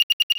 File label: det pack timer